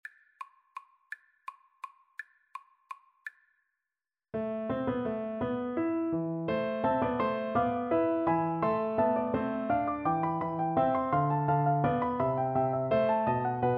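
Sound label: recorder karaoke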